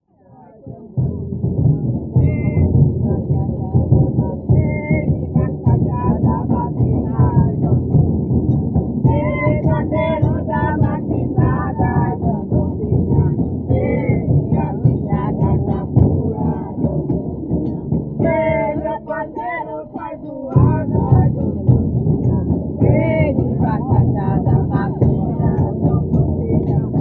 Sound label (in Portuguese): BATUQUE